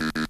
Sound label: keys two notes; Loops; Phonky